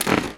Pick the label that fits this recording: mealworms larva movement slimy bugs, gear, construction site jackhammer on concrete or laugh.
gear